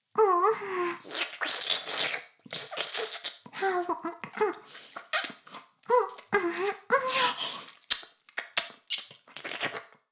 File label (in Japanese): 耳舐め音声 (LR逆); 古き城のドラゴニート2